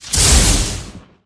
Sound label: Chain Lighting